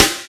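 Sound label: Vintage Snare, Roland BK-5; Snares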